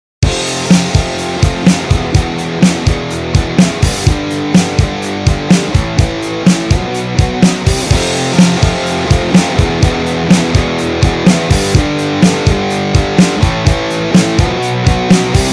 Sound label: Strumming ult rock